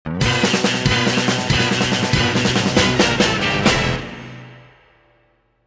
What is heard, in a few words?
Music Bumper Tail; Team Fortress 2 Source Filmmaker leak music